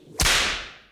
arc attack layer; weapons; dota; queenofpain

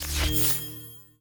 player radar appear; ui; dota

